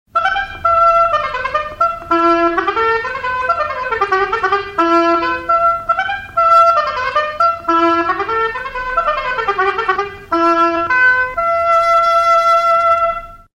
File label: oboe excerpt